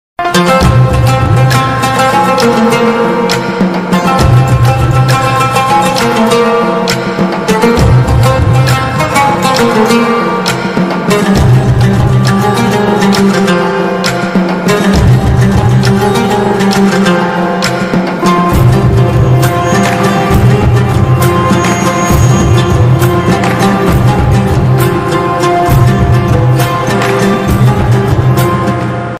telugu sad status bgm; surya so krishnan

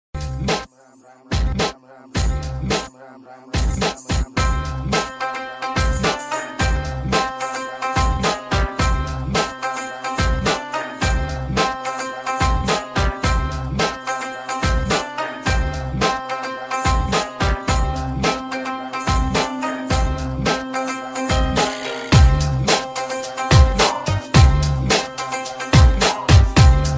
instrumental ringtone background music; bhool bhulaiya

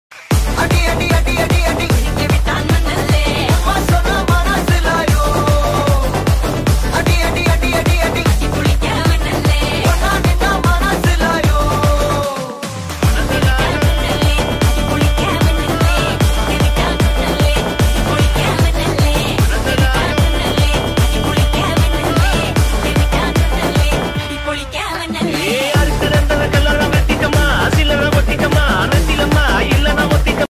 song bgm ringtone

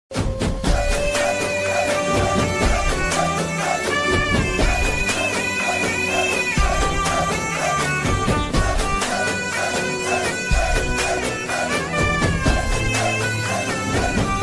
raavanan bgm ringtone

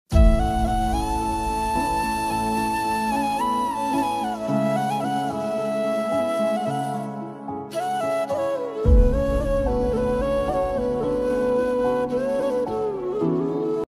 krishna flute ringtone

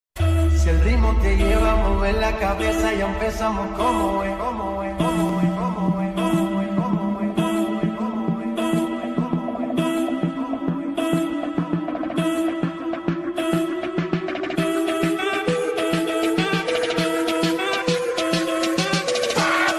English songs ringtone

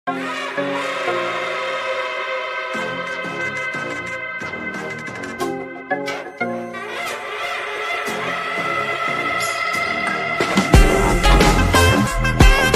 entry bgm ringtone malayalam ringtone; aavesham; fahadh faasil

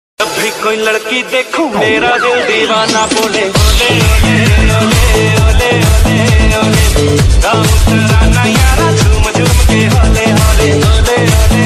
punjabi ringtone; Jawani Jaaneman

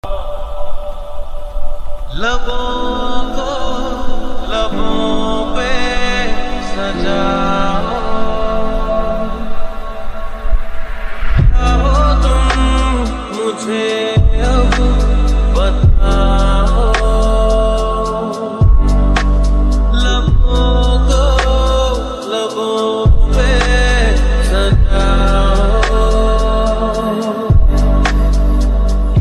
love ringtone

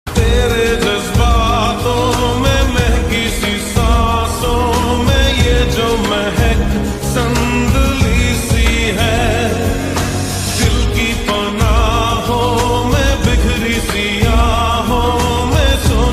slowed and reverb songs

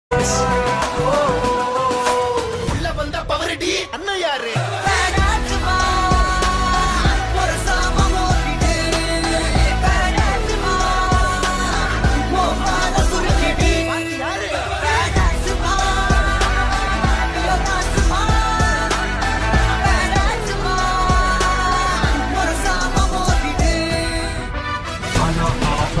bgm ringtone remix ringtone; master x leo bgm ringtone

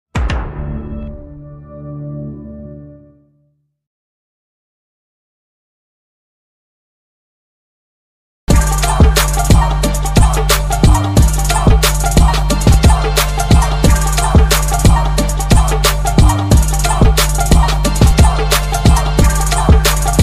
instrumental ringtone, punjabi

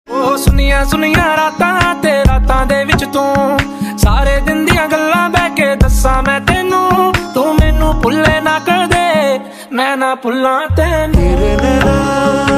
new punjabi song ringtone

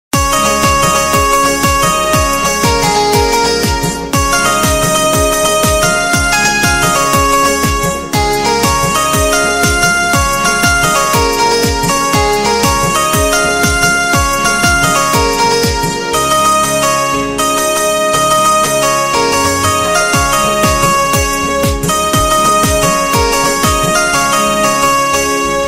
love ringtone, instrumental ringtone

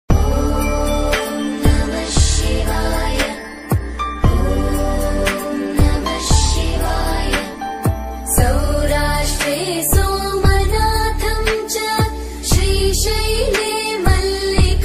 telugu song; godess shiva

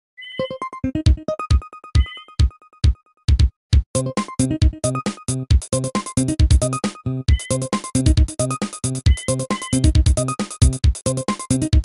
Nokia ringtone disco

